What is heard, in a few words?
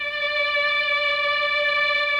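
MELLOSTR, Mellotron Violins; Sonic Reality; Vintage Timetraveler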